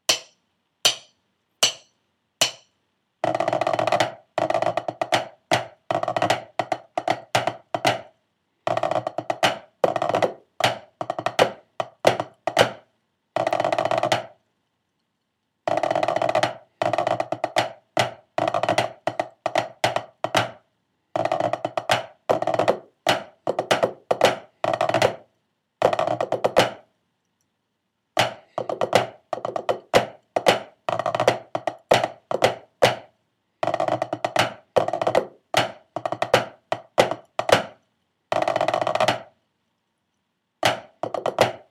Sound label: snare tenor